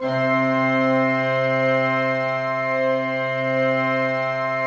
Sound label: PLENO C3, Cathedral Organ; Propeller Island